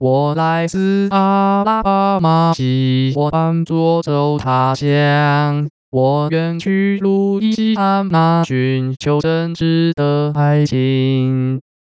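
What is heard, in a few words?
synthetic singing voice, 男聲, original; O! Susanna; (噢! 蘇珊娜); 使用頻譜包絡內插之國語歌聲合成 (Mandarin Singing Voice Synthesis Using Spectral Envelope Interpolation)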